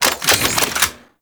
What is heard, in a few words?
Burst Gun Equip; Warframe; Archwing